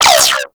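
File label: Gatling Gun Projectile By; Warframe; Tau Gatling Gun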